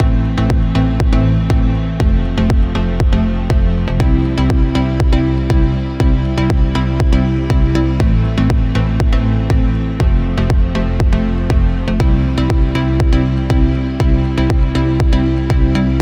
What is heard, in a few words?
corp beat